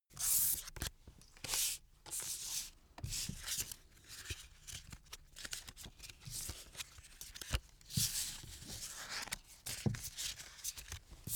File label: playing cards handle; comedy-cartoon; spielzeug